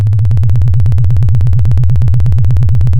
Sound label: Sound of a plane taking off